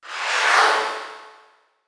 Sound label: test lights